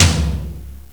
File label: Room Tom; GMPlay; Drums; TG300B